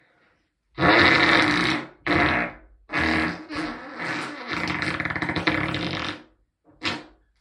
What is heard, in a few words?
muddy fart